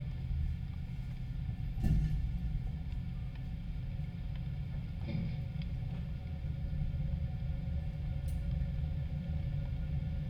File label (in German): Aufnahme (Fieldrecording); Vocoder Mix live, neues Set-Up; Das kleine Regen Rhythmus Orchester